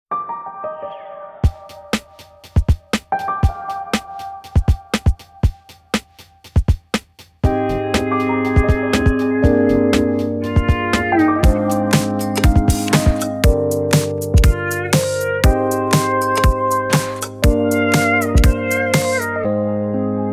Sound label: Louise Tone